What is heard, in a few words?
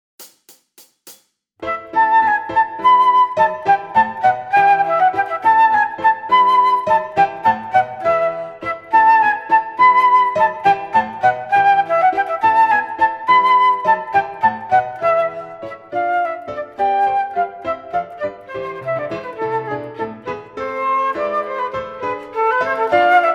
Passepieds Full Flute